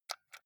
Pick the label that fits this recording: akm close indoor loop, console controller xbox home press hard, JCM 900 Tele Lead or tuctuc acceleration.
console controller xbox home press hard